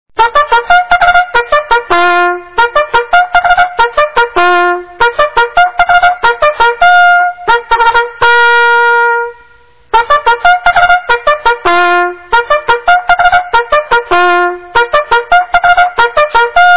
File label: fatigue call, bugle; usscouts